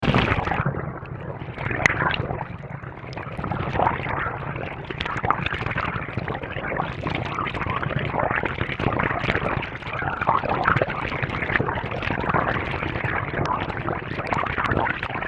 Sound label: Hydrophone Running Water